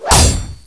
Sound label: combat knife wall